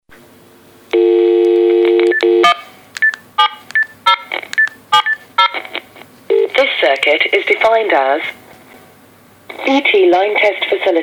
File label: DSL Noise